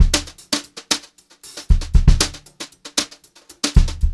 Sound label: HOTROD BEAT, Brushed Drum Loops